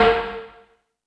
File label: Tool Kit, Drum Machines